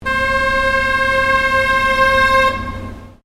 BOAT WATER TAXI LARGE HORN, Large river water taxi; Williamstown; Boats (Sound Effects:Vehicles)